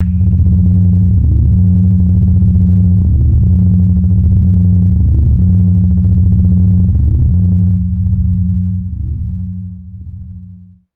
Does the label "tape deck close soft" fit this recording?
No